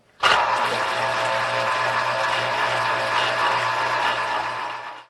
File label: gas; Quentin C's Gameroom